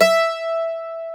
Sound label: mandolin, Stereo Mandolin